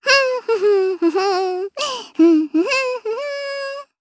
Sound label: Vox Hum jp; Miruru; Kings Raid